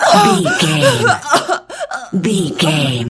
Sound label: voice female death intense